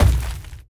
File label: giant hopper land